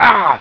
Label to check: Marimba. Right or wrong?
Wrong